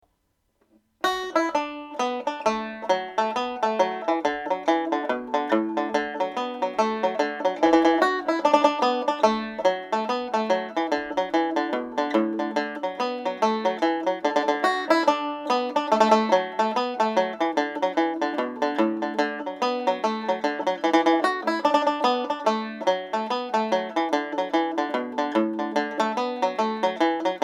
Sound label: kittys wedding, hornpipes, banjo